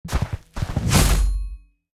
Skill Strike; starbites

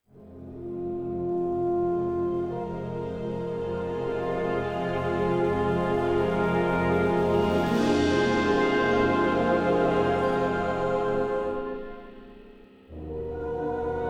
castle music

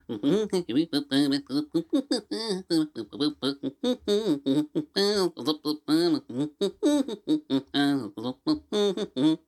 Hum; gamerip; Mender

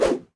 Rattle Snake; Rabbids Multiverse

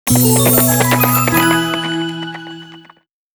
Banner Jingle; Pocket Card Jockey Original Soundtrack

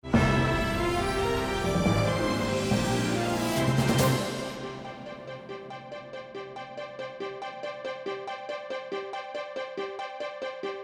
Victory Intro; maliki poison of the past demo switch windows gamerip 2025

